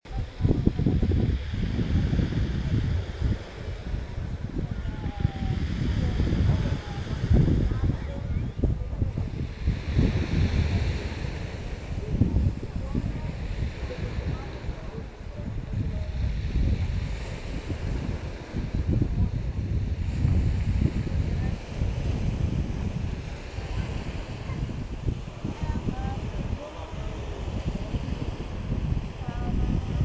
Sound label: sound of the waves at beach, ibiza; beach club amante cala llonga